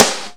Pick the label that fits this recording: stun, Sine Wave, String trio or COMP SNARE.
COMP SNARE